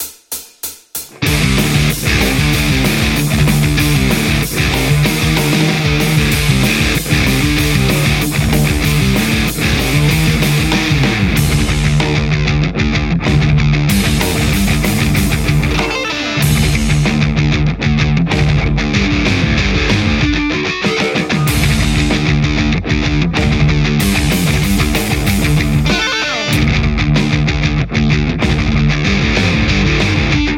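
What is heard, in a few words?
metal Stratocaster; wagnersharp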